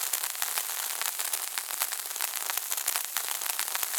Burning Fuse Loop

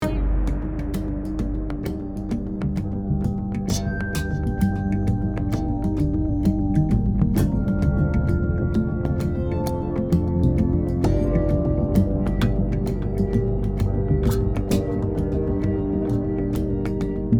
Tense (Loop)

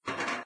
Valve; doodle jump 2; android

